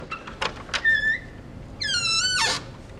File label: Door Opening Creaking